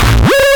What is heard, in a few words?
Synth Shots; Vengeance Samples